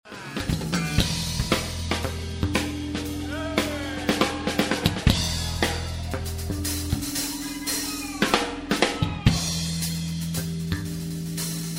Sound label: Bassolo